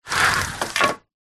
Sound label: household blind venetian drop lower; zapsplat